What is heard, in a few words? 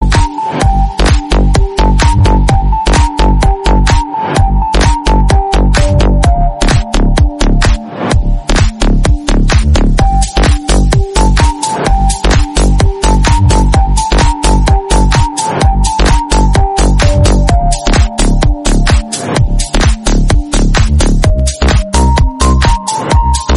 space melody ringtone